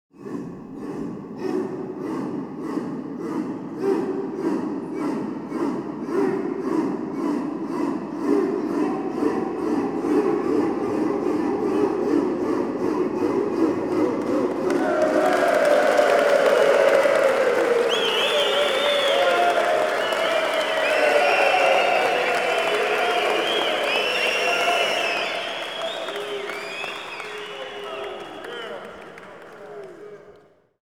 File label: 100 men chant cheer; Asian Show Sound Effects